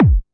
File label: electro kick; Electro Kit; STYLE KITS (Hip Hop, Etc); Boy In A Band Electro Kit